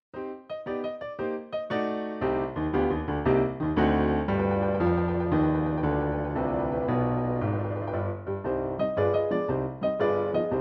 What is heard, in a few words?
piano roll